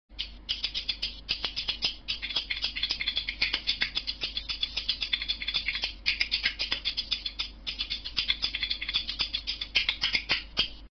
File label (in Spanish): cucharas; tradiberia